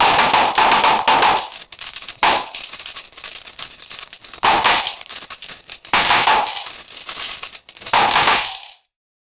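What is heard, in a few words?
loud insane loud chain swing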